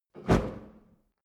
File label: fire torch impact; swooshes-swishes